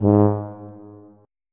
tuba note

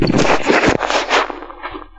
grab, female

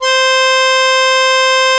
tuba loop note

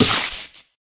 electro bounce, weapons

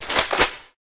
weapon pickup

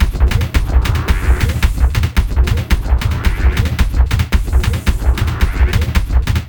spiderbot walk, vehicles

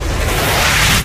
fireball prefire, weapons

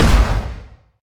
tag fire, weapons

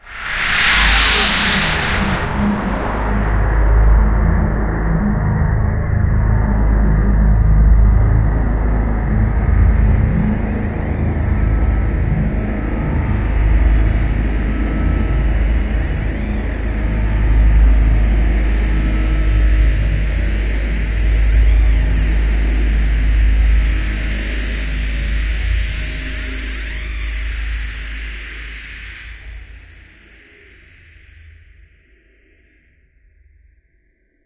powerup shield

